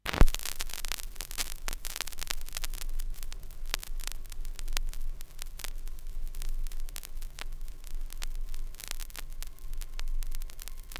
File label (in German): knacksen